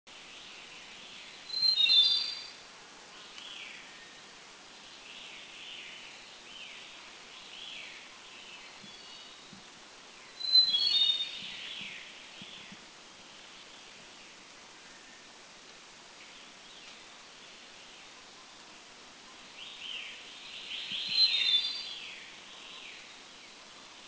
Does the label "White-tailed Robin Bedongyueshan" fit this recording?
Yes